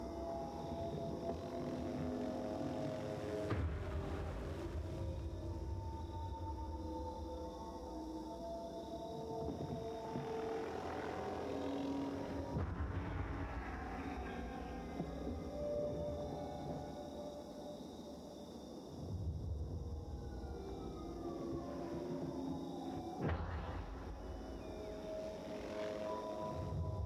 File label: amb